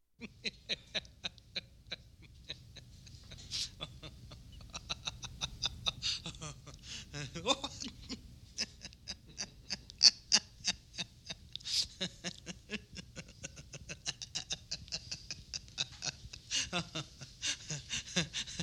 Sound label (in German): aufschauckelndes lachen